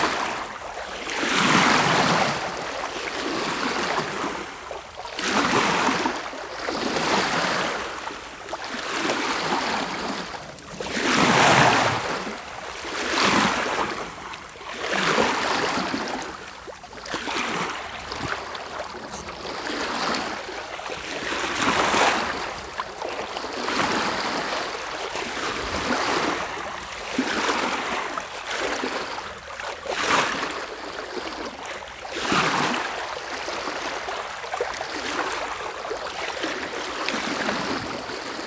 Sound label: Sailing